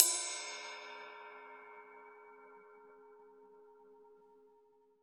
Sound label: RAW RIDE, Drums Acoustic; AKAI; 90s sample CD; USB Soundscan vol.10; RAW KIT 7